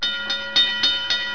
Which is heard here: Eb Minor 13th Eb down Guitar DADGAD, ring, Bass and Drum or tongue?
ring